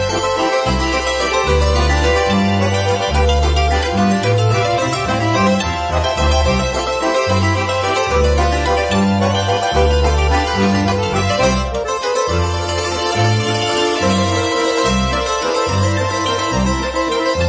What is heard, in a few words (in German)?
landler